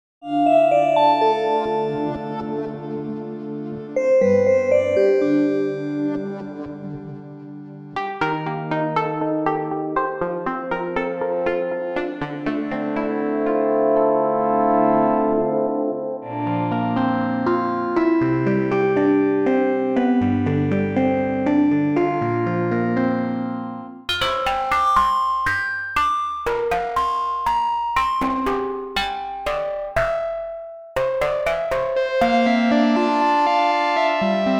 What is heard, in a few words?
wiggle presets keys